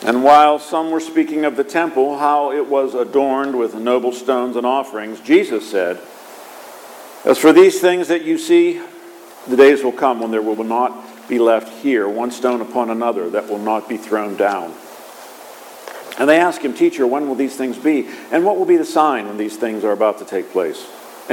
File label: Gospel